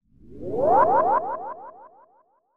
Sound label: Sci Fi Spaceship; Sci Fi Spaceship Ascend Warp; Spacecrafts